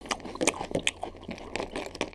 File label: Pet Eating; creatures pets